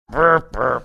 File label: imitating kazoo